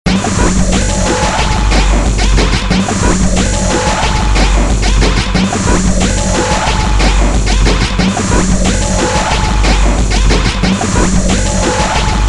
Error; Eastern Mind Chu Teng

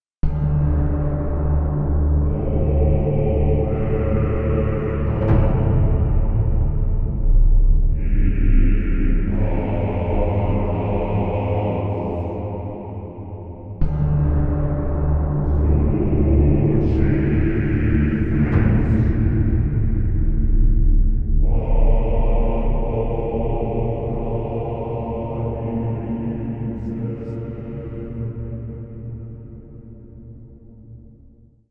Ruins Front

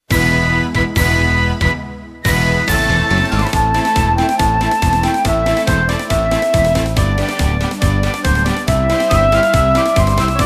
Music Menu event

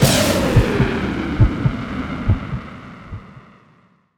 Matrix Style Slowdown

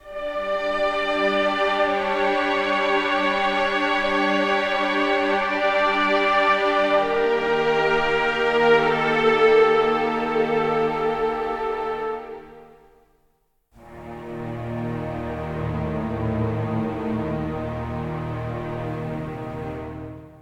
Ambient Strings Stem; ps4 ps5 switch windows xbox one xbox series xs

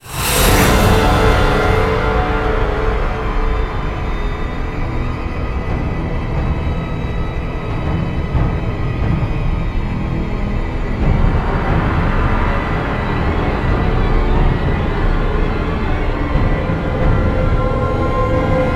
Boss Intro Major

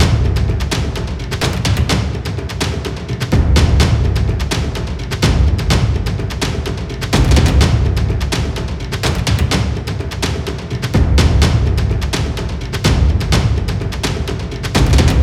Battle Start Loop; crossout ost

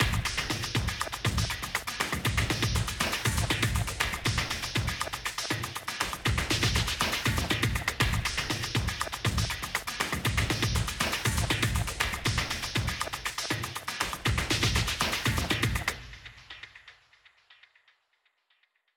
Synth perc Breakdown; Worms Rumble; PS4 PS5 Switch Windows Xbox One Xbox Series XS

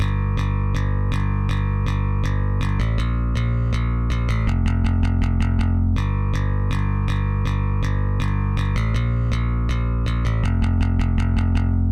Drums and Jingles Battle BASS D

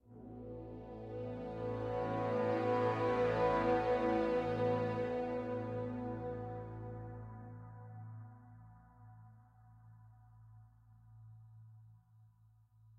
Amb Swell; Worms Rumble; PS4 PS5 Switch Windows Xbox One Xbox Series XS

